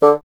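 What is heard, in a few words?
wind bassoon D; Miroslav Vitous - Solo Instruments VOL-1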